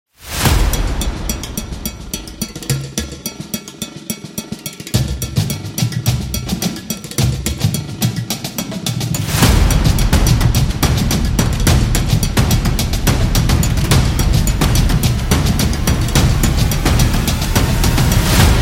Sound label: Football